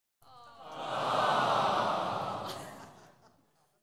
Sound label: Light Entertainment Audience - Sympathetic 'aaah'; Rec. At Bbc Paris Theatre